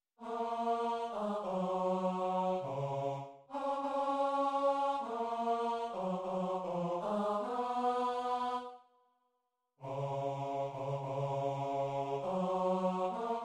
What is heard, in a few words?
chorus of the hebrew slaves tenor